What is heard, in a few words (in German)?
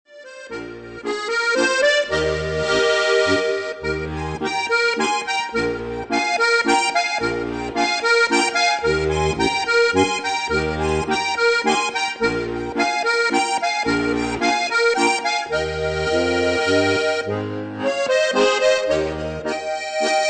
steirer